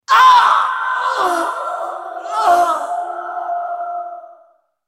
dying; BlackIceAnivia

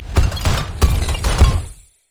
rock; lissandra skin01

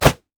smokebomb; LoL; akali

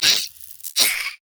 voidling death; LoL; alzahar

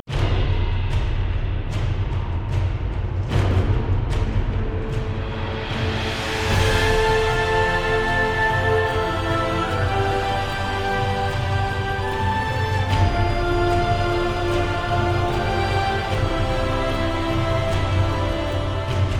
victory; LoL; howlingabyss

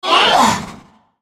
spellcast effort; FizzSkin04

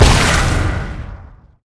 launch; LoL; syndra

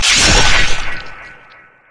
crit attack; LoL; yasuo base

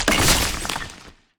shatter; lissandra skin01

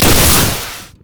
buster shot; rocket tristana

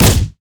monkeyking nimbus kick; LoL

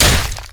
basicattack human; LoL; shyvana

